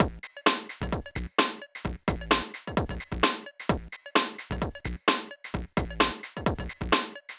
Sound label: Breaks Or House, 130 BPM, Percussion; Sonic Foundry (Sony Creative Software); Underground UK House & Electro